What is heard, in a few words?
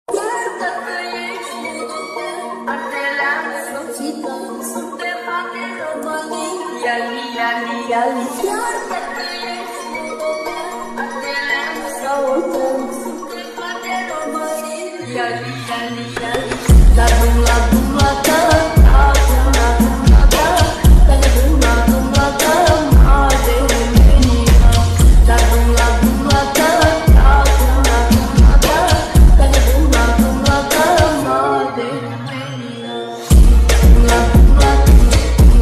brazilian funk remix slowed reverb